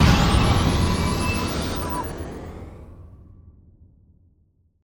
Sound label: success